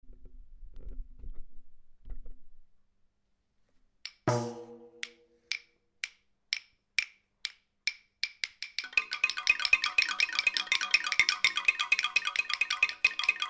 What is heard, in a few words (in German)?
Eisenbahnmusik